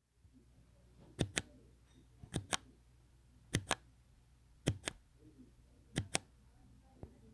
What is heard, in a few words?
ring